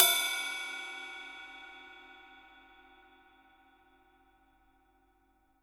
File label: CYM, Real Drum Kit